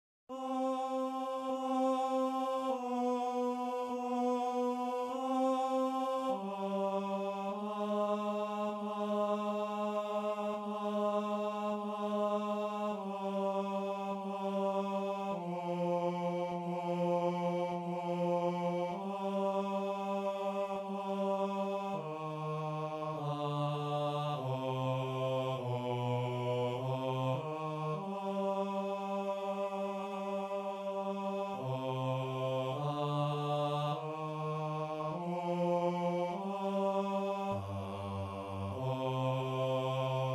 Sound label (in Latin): stabat mater bajo